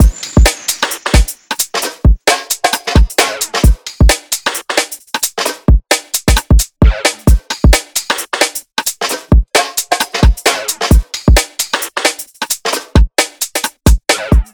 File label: Drum Pattern 140 BPM; Crowdsourced Sample Pack; Drum Kit; Drum Loops